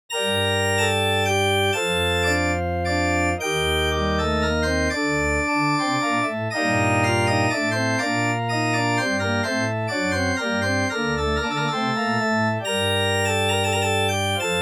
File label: Church Organ A, Minuetto in g minor, dry; J.S. Bach - Minuetto in g minor; Andreas Instrument Sounds AB; Church Organ A - Dry Demo